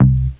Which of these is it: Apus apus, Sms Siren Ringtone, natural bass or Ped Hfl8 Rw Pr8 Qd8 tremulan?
natural bass